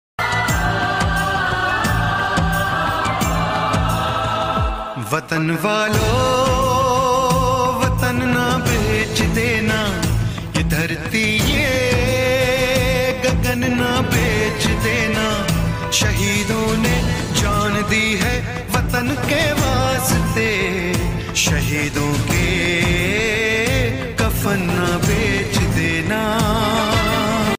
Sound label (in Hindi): desh bhakti ringtone; border